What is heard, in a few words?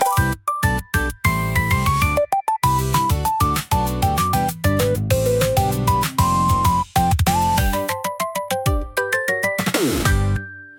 genki zenkai pop jingle; free jingles